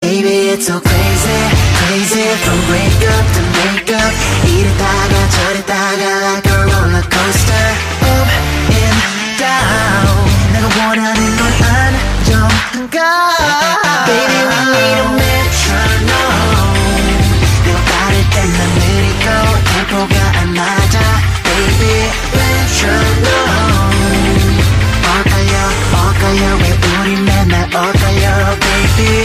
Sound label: Metronome